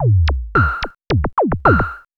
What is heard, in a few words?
Beat, Drums & Percussion; Electrocution; Beats